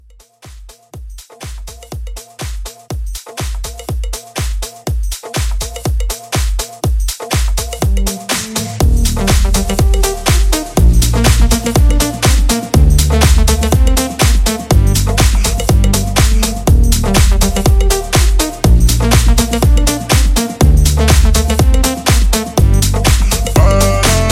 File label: Mixshow Re Drum 122; Acapulco; remixplanet